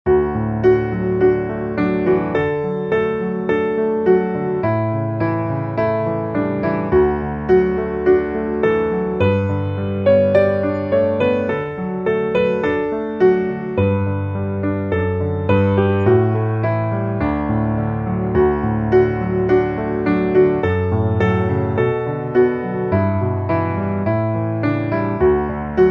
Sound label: piano